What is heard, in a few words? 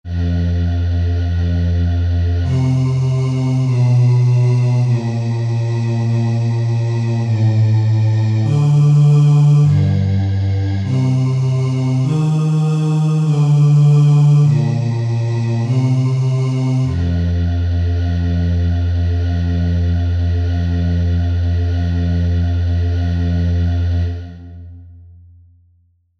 jazzy, Bass, barbershop